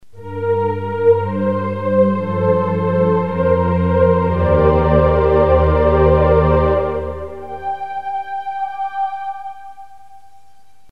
Choir Pad; Generalmusic pRP8; Preset demos

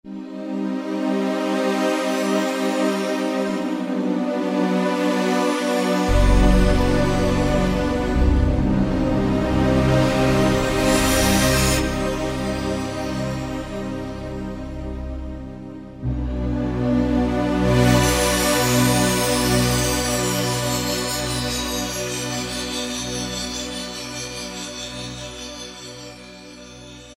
Sparks, Alesis QS8; Factory Preset examples

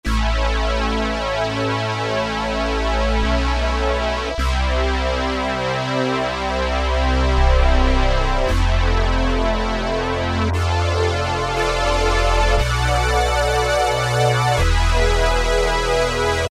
synth-BatPhaze; E-mu Orbit V2; Factory presets

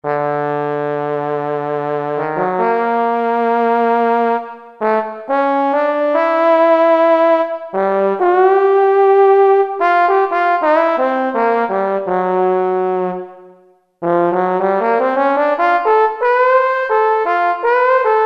Solo Trombone, Kurzweil K2000; Kurzweil K2000 Value Pack; Extra Programs; ORCH1X

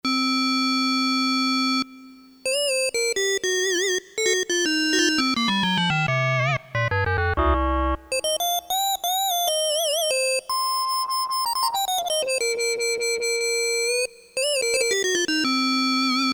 Metal, Korg DSS-1, Created Waveforms

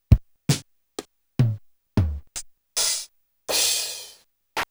DDM-110 DRUM SAMPLES, Korg

